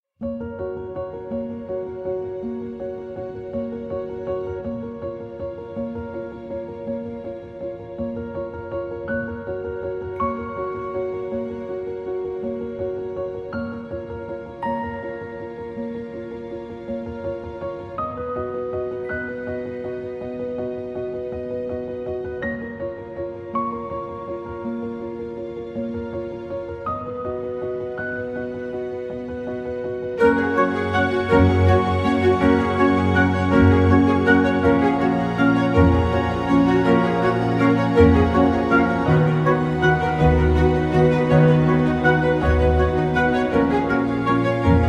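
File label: background music without words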